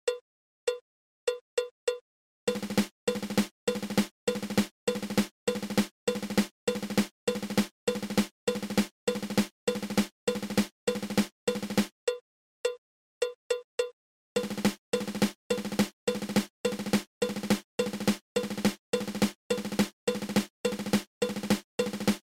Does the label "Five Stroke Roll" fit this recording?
Yes